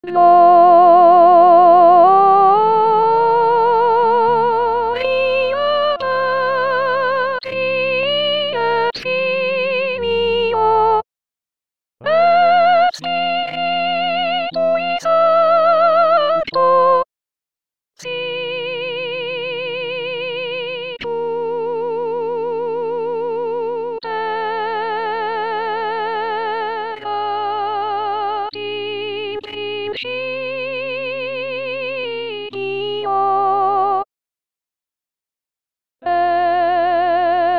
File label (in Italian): Laudate Dominum solo coro soprani; voci guida; Mozart; corali in vaticano 2024